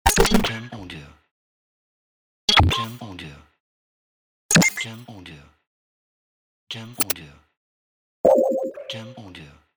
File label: Short Glitch Noises